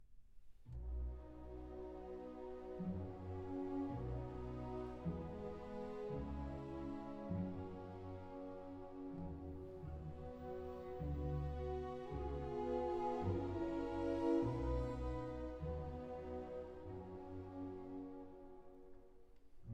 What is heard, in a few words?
Piano Concerto in E-Flat Major, Adagio un poco mosso in B Major; Beethoven